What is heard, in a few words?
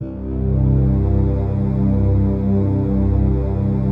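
PAD, atmospheric pads; Best Service; XX Large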